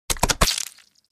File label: Cartoon splat hit multiple splats squelchy